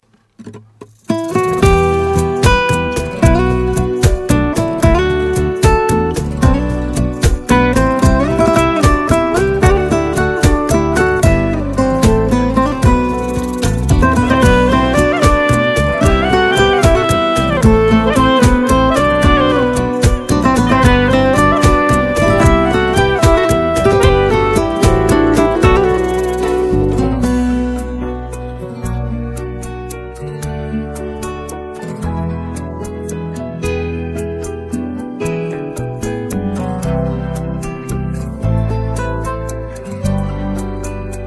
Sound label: acoustic Version